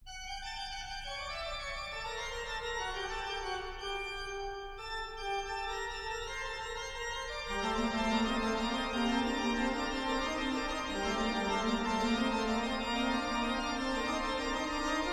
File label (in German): Buxtehude g-moll, Hoerbeispiel Muensterorgel